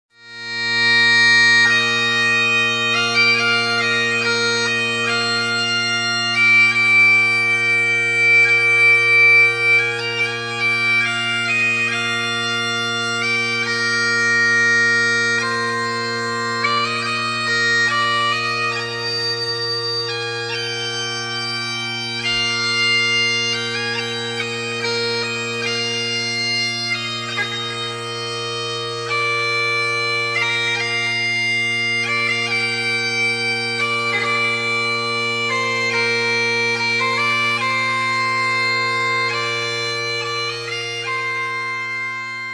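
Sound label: bagpipes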